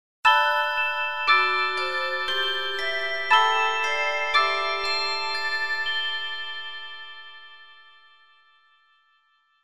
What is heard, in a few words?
chime short